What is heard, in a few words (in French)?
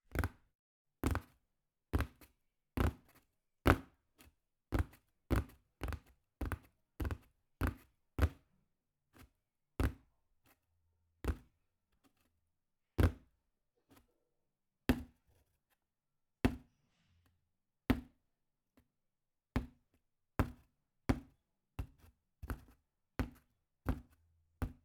CARTON DOIGTS TOC TOQUER MANIPULATION